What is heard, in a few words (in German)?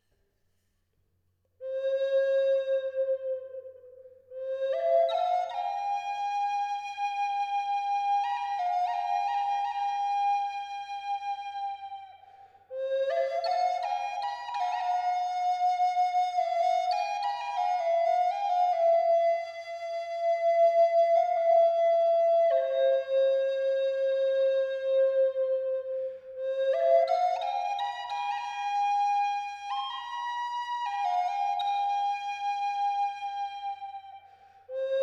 Haselbaum Cis hoch 432Hz